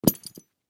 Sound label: Belt Leather Drop, Belt Leather Drop Carpet; Drops; Foley; Objects; Blastwave